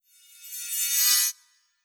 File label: Ice Thorn Charge; Ice Thorn Charge2